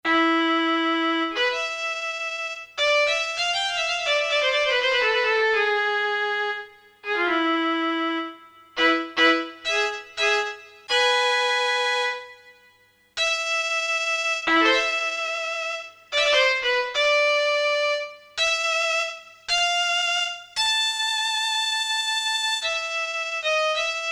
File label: violin, Korg DSS-1 strings